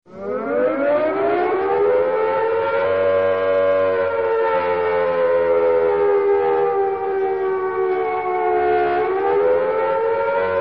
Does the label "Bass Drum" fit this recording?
No